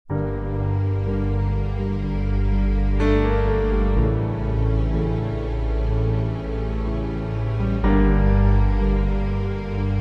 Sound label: Instrumental